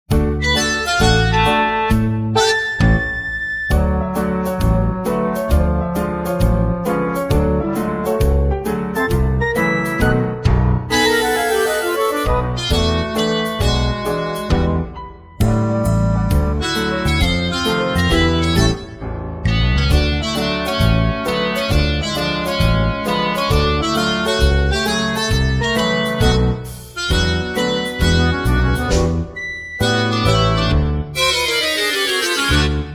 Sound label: instrumental